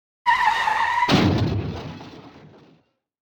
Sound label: car crash